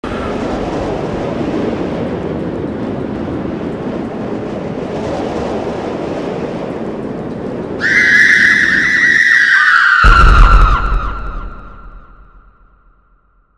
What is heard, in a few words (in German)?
der schrei; mekkasymposium02